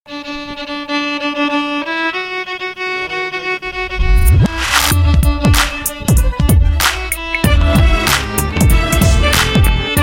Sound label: Violin; Stroomm Studiedag